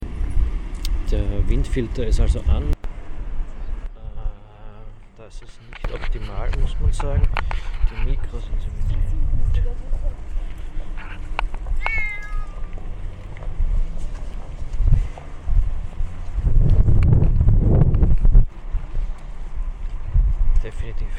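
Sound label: test recording with wind filter, street; testaufnahme mit windfilter straße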